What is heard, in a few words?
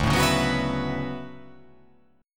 D Major 13th D down